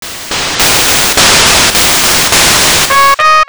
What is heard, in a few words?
brainshock; mother2